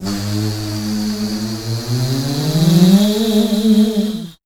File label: WEIRDOS, Voice Spectral